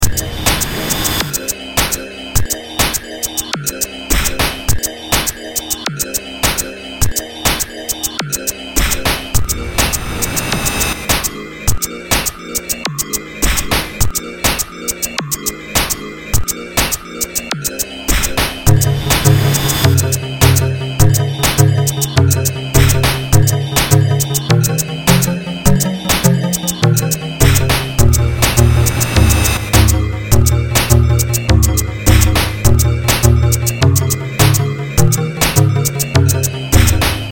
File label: hallo bells